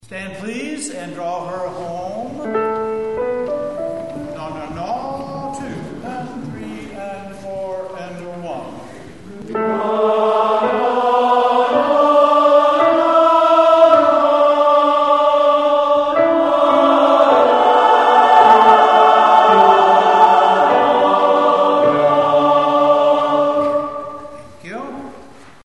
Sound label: Serenade SATB notes